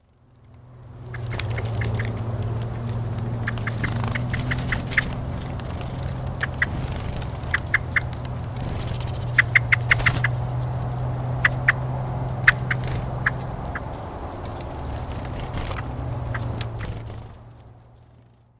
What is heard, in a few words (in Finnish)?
mustarastaan arki; linnut